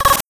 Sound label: Alarm Sound